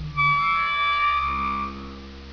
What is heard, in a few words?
mom door